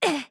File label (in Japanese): 攻撃